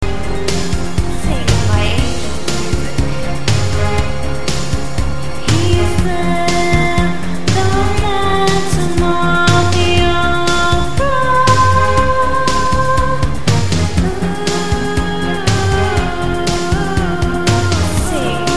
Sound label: End Of Phantom Chimes